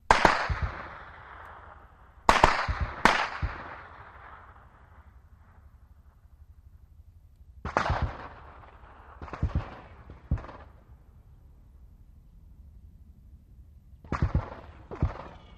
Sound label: .50 Caliber: Firing With Bullet Ricochets. Very Busy, Various Perspectives. Vehicle By At Tail. Good Battlefield Background Element.